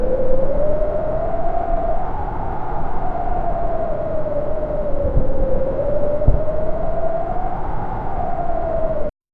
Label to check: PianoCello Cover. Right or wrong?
Wrong